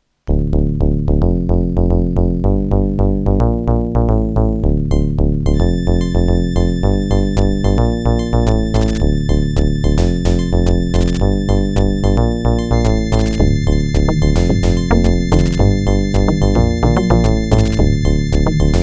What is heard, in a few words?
Intro Adlib SpkrOut; Crystal 3D Sound Card